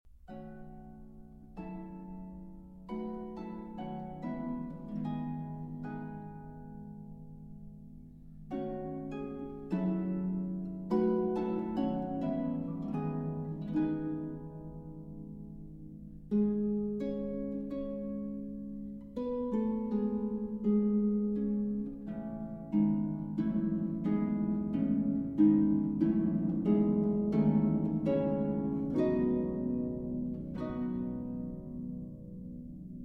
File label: piano, Sarabande; Pour le piano, L. 95; Debussy